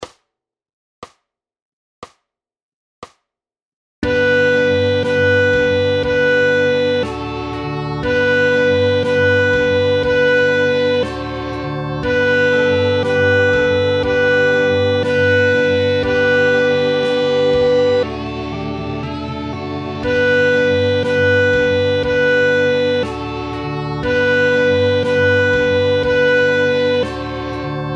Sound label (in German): first song klarinette